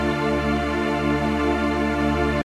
re minor; playback

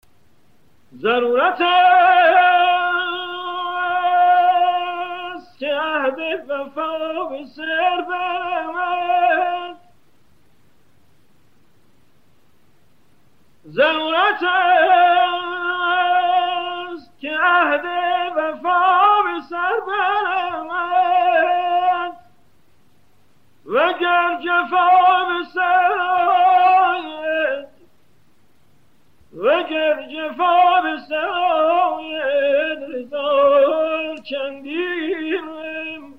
hejaz, aboata

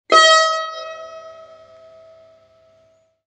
tar, "mi" c

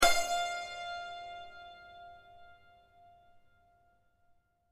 santor fa

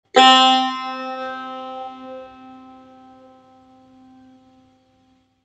tar do

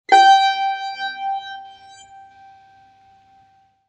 tar sol

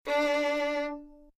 violon re

